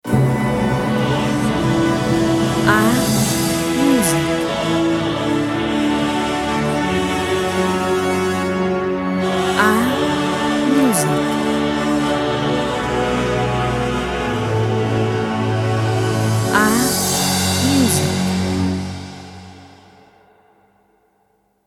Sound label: instrumental